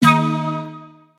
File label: stop bonus; Enchanted Cleopatra